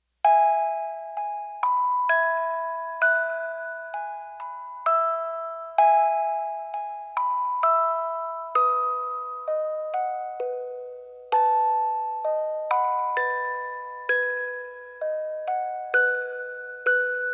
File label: Music Box Version; Rock A Bye Baby; Tiny Tunes; Narrative Mind